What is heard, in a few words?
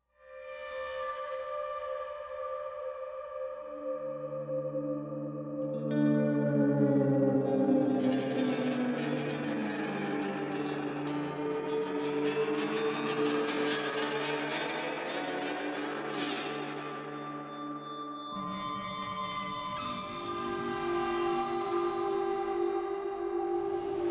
Scrap Commons Ambience; OST Borderlands